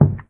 Wood soft

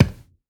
Tree trunk Collision

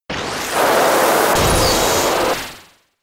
Muddy Water; Pokemon; Gen 5; Blk Wht Blk2 Wht2

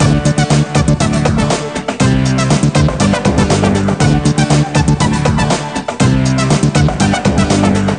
Disco; j4g a girl s world 2009 nds

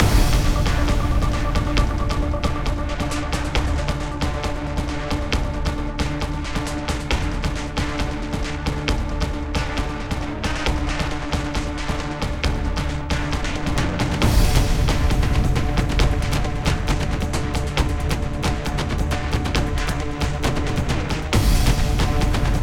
Combat Music; XCOM Enemy Within

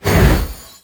Gust Small Positive

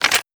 archer foley bow handling; Aragami

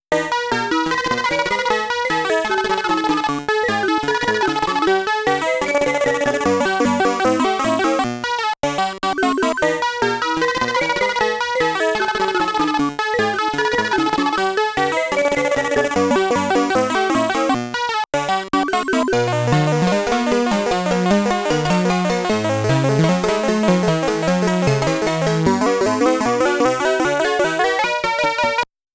Hurry Up Music; mappy

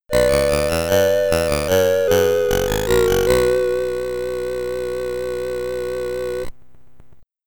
Game Over, SID Stereo, commodore 64

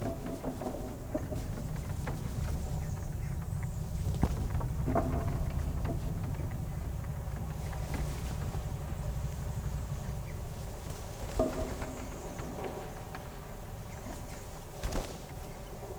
Ambience rooftops; adams venture origins

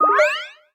hand loop

